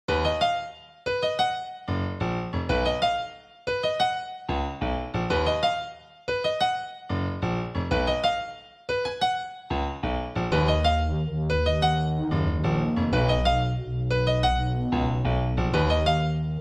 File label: Instrumental